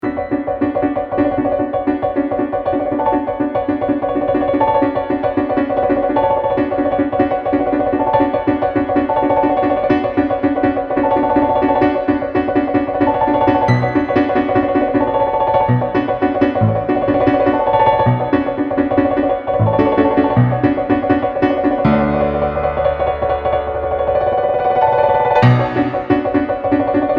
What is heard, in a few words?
Processing in B Minor